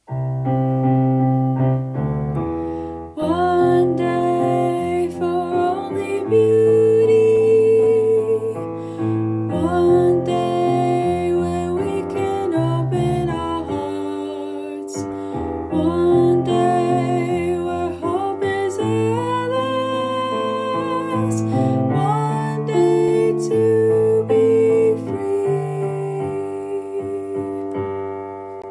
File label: soprano